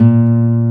G A1#, NYLON; AKAI S-Series CD-ROM Sound Library VOL-1

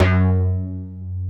SLOPPY BASS; BASS SOUNDS

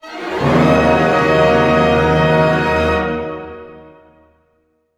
GLIS D# minor, gliss minor, orchestra; Sound On Sound - Peter Siedleczek's Orchestra

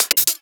Closed Hihats; Sliced

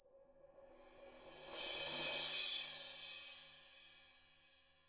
ZILDP SWELL; ILIO; Double Platinum Drums 1

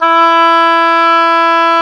WND OBOE, Oboe Brth; Roland L-CDX-03 Disk 1

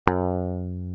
GTR E GTR, Clean E.Gtr

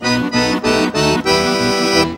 ACC BRK, ACC BREAKS; Best Service; World Colours

